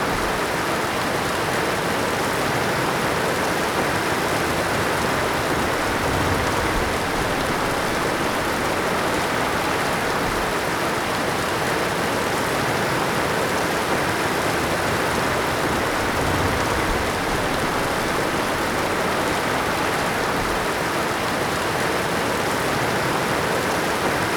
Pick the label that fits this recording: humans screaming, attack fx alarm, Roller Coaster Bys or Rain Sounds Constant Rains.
Rain Sounds Constant Rains